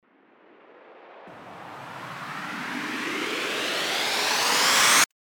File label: RISER